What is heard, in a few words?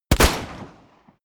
pistol close shot; schusswaffen